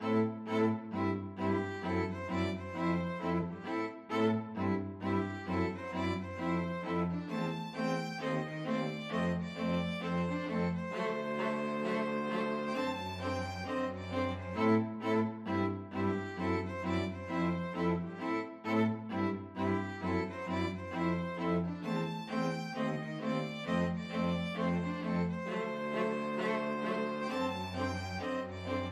string quartet; bob cat

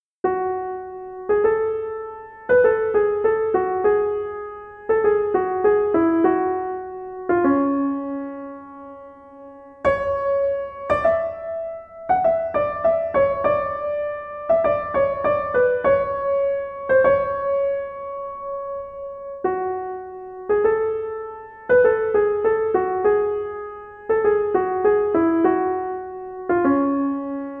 faure pavane, keyboard

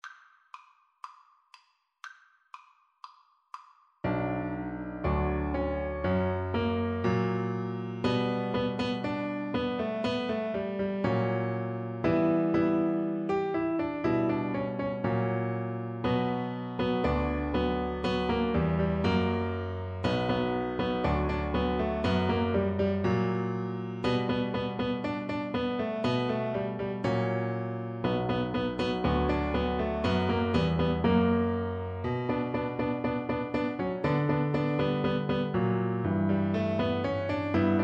toryanse viola

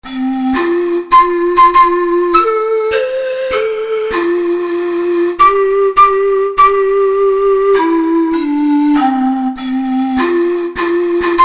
wheels on the bus, ocarina four hole

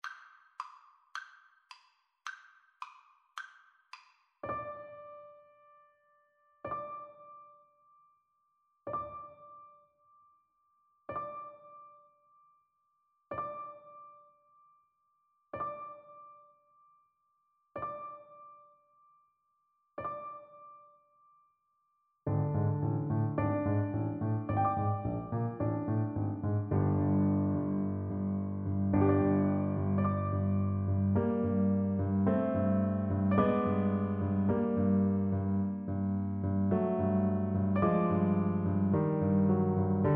Euphonium

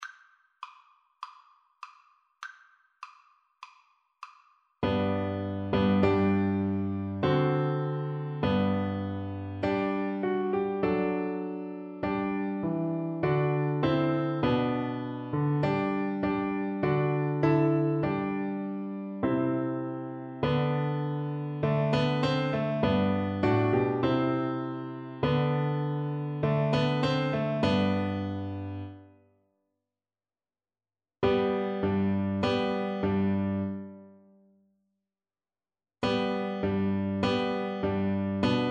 ocarina, Ocarina - Four Hole

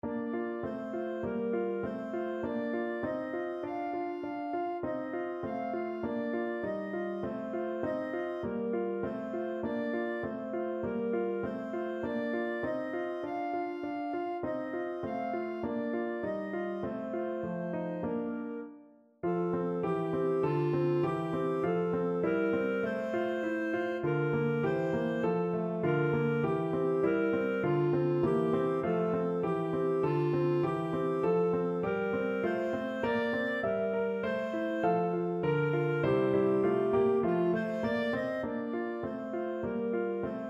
humming song, clarinet; schumann

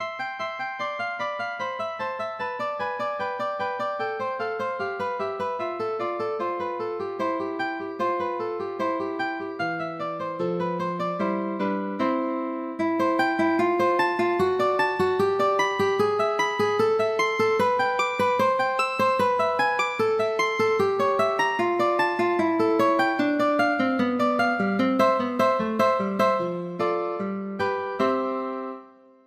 etudes, guitar; giuliani; 24 etudes

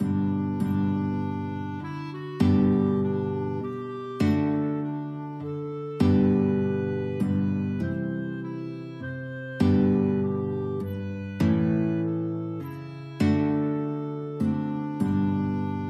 guitar clarinet duet

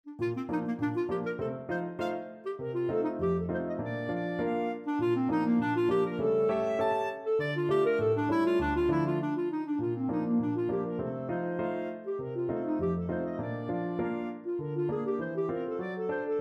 pizziccati

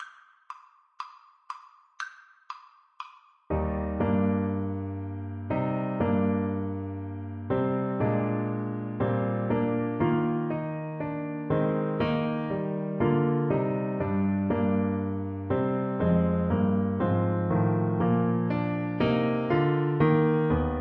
Trumpet

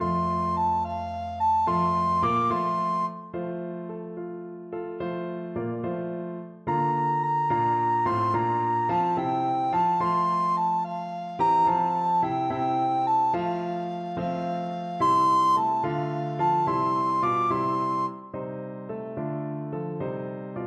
christ was born, recorder

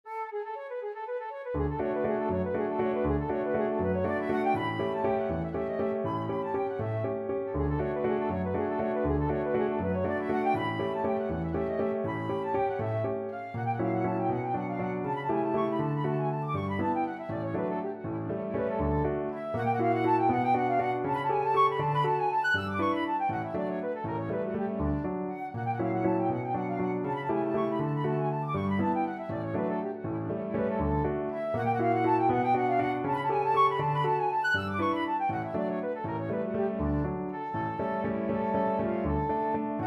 chopin minute, flute

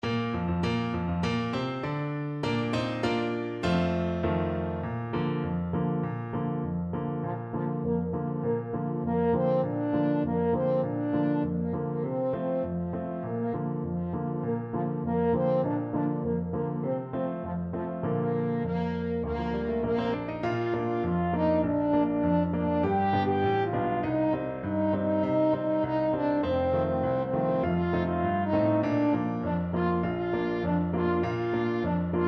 a la vibora, french horn

